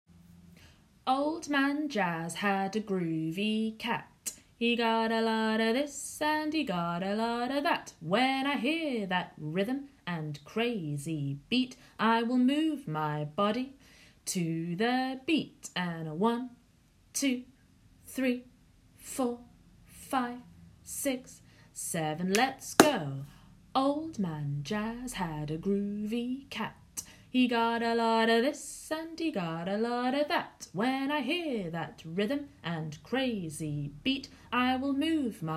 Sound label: Old Man Jazz